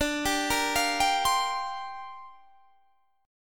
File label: Bb 6th Add 9th D